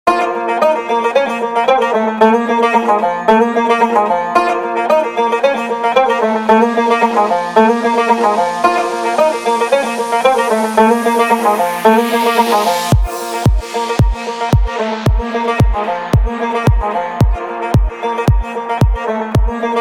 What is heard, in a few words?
Banjo Beat Remix